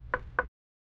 wood

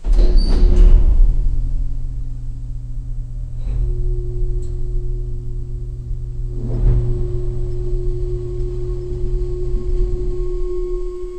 a modern elevator stops

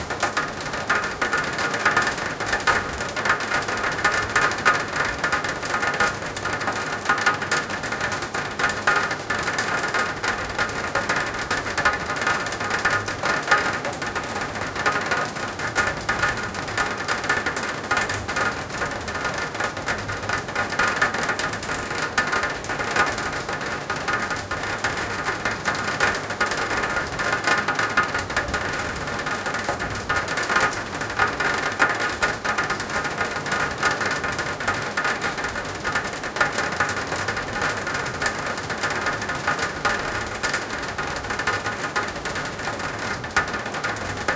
asmr of heavy rain on; 3d stereo

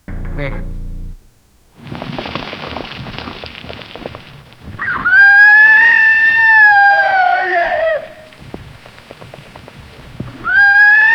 a powerful horse whinny

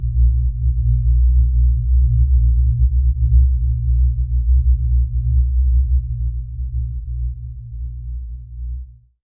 low eerie metallic hum, well

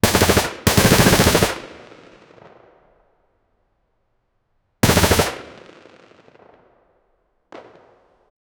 short very sharp metal on, machine gun

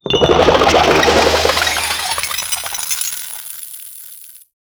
cartoon fantasy ice nova

